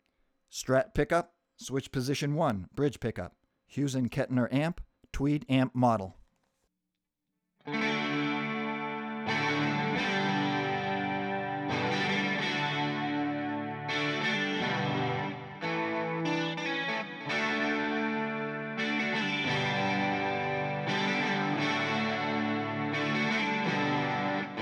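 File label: strat bridge tweed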